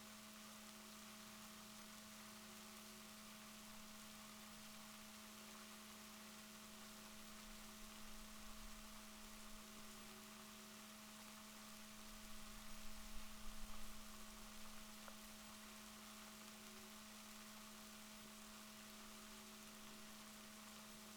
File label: rorquals positif, Balaenoptera Physalus Chile